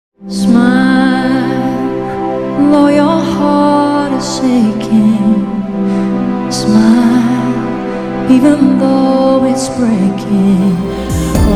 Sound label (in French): Chorale